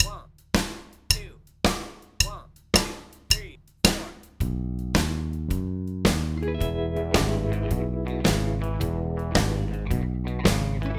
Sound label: honky tonk shuffle in e; backing tracks; honky tonk guitar solo